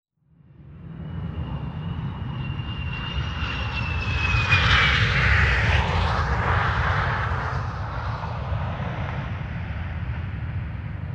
jet landing

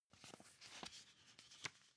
magazine handle

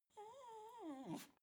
dog whimper attention seeking

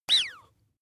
bath duck release

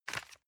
envelope grab medium

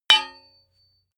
hammer hit metal dull

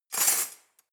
cutlery knives drawer place hard

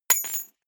key ring small drop parquet hard

